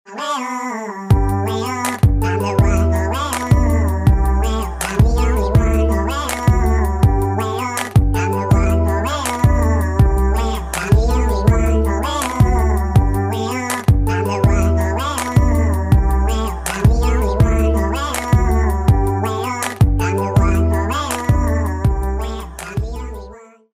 English Cute Ringtone

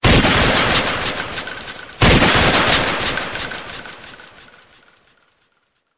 Gun Shot Ringtone; Doom

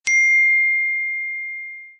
Chess Notification

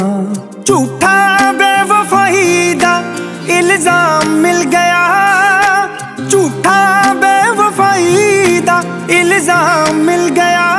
Sad Punjabi New Song Ringtone

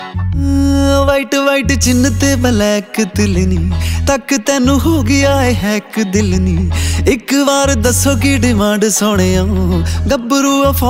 Punjabi Song Ringtone

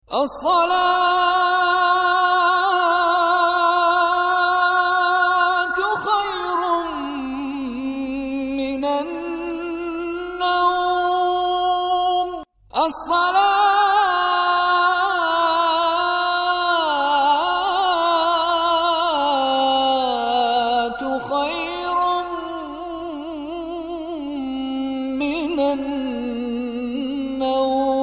Fajr Alarm Mecca Ringtone

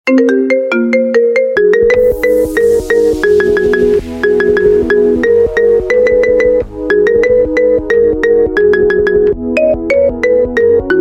Remix Instrumental Ringtone

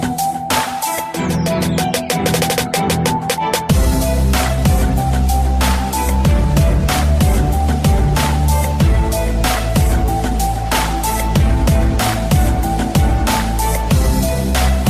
Alien Ringtone; iPhone

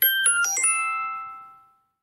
iPhone 12 Circles Ringtone ios 14

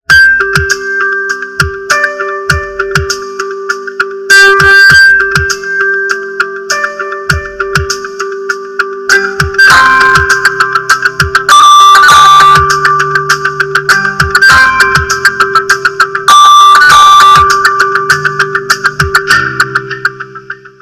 Ringtone; Oppo Reno4 Lite

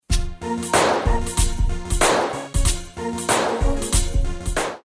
samsung p30 sms ringtone

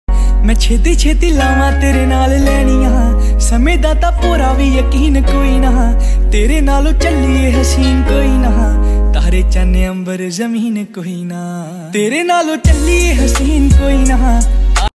Punjabi Ringtone

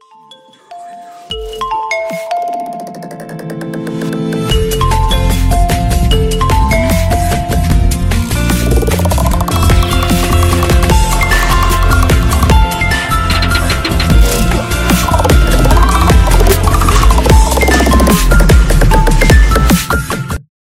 Oppo Ringtone

